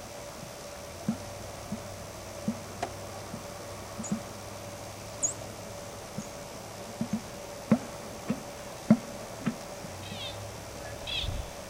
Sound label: glunking mews, elk